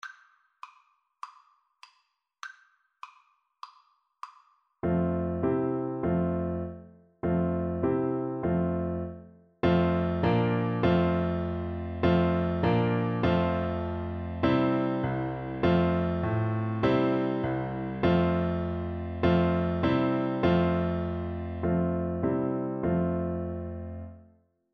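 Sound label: recorder karaoke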